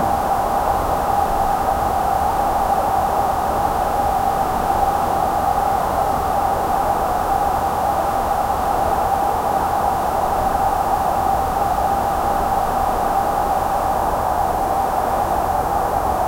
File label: natural white noise of, winter